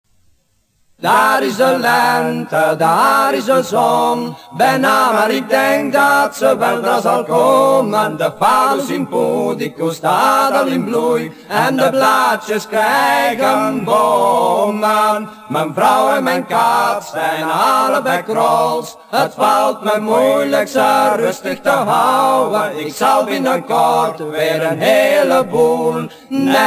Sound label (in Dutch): Een Vrolijk Lentelied; Folklore